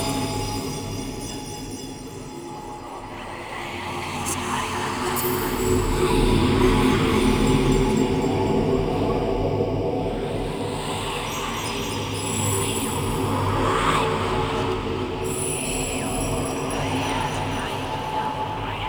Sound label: Boon Menu Loop, UI; Duviri